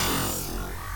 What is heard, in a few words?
Robotic Hit